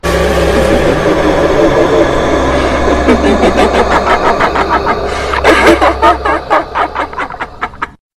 Jumpscare Sound

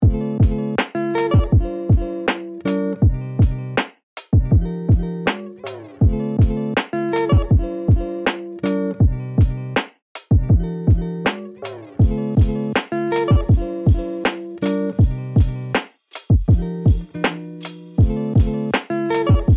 springtime stroll

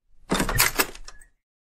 door opening sound effect; bbs factory

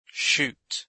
shoot

